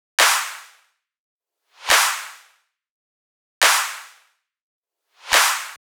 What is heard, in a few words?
Riddim Drum Loop Snare Clap (140); Ghosthack; Riddim Essentials 2020